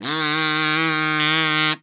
KAZOP E3, KAZOO; Xsample VOL-9

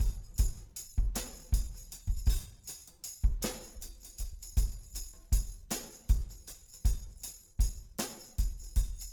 105 6 8 sn tam

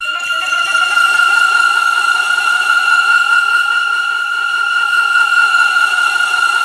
PADS; OMNIVERSUM; Masterbits - Tekkno Trax; SYNTHFX+PADS

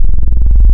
Sine To Oct Sine Inv Phase, wavetables; Animoog

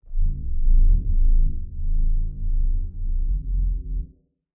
Malfunction Synth, malfunctioning synth samples

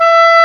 OBOE E4; AKAI S-Series CD-ROM Sound Library VOL-8

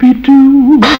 "be doo", vocal

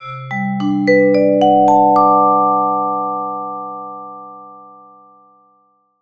VBLCK, VIBE LICKS; Sampleheads - Dave Samuels Marimba & Vibes

